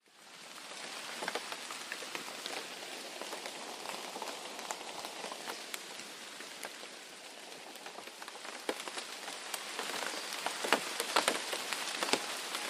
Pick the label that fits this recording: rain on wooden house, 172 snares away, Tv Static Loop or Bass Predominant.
rain on wooden house